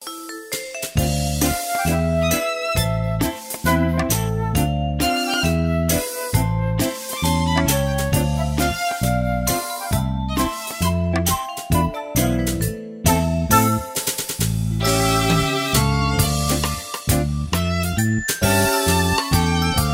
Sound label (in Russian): Instrumental karaoke version